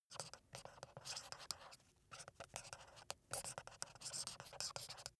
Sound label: marker pen write paper sequence